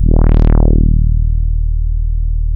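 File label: MOOG MODULAR D#1; Hollywood Edge - Giorgio Moroder Rare Synthesizer Collection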